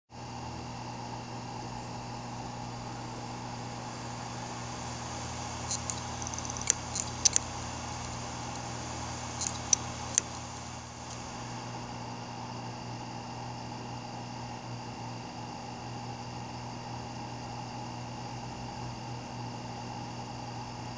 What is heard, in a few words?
Western Digital 1TB WD10EARS